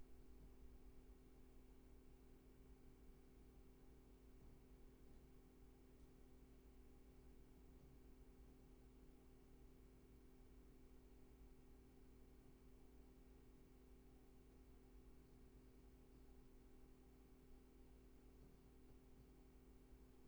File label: Room tone